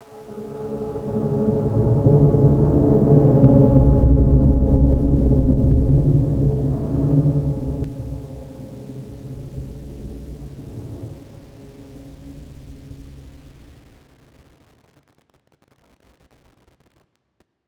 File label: Dungeon; SFX; Ambient - General